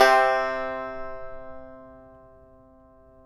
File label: tar; Roland - LA Composer vol. 3